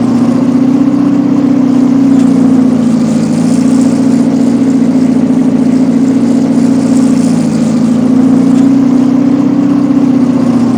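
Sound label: tank engine; WAR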